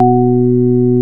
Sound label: KURZ ORG 1-B, organs; Greytsounds VOL-1